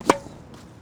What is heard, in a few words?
tennis